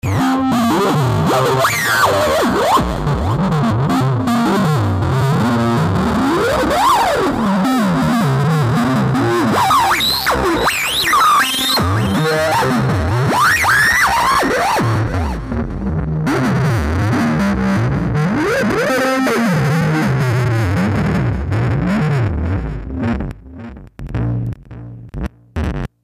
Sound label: vocoder